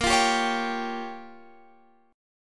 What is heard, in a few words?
A sharp Minor 6th A sharp, Guitar Open F; A#m6; strummed; x,17,17,14,16,14